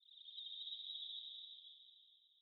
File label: cave insect trill; Rust